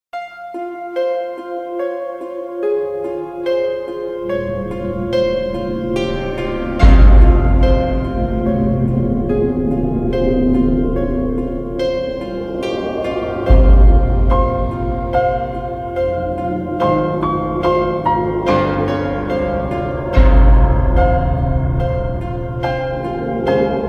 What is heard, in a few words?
creepy music box